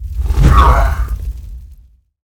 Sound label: War Attack; Greatblock